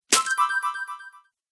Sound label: Magic Coin, cartoon; Cartoon Sound Design Sound Effects